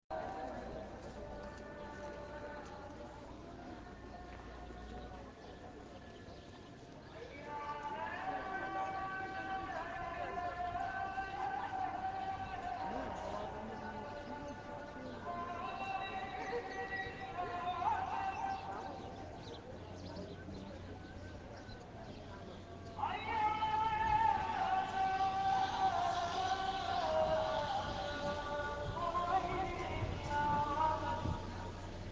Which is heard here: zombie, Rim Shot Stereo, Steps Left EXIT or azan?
azan